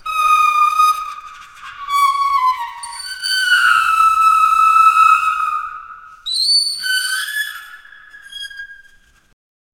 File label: creepy mice squeaking and, squeals